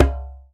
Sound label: Djembe Slap Gun